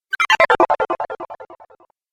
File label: Falling Magic; Magic Sound Effects